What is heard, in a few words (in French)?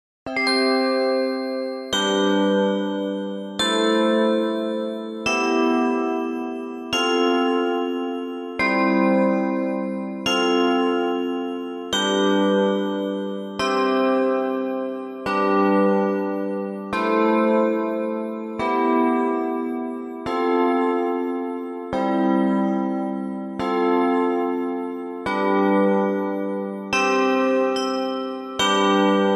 canon majeur pour clochettes; pachelbel johann; anglais